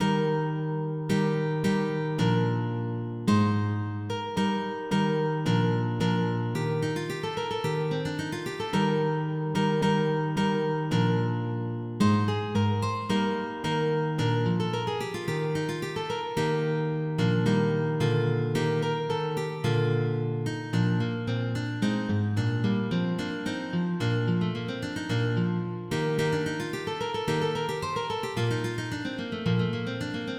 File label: Pavan; Andronica